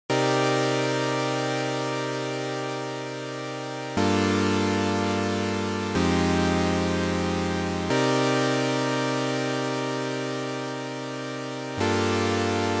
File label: Chords Psychedelic Before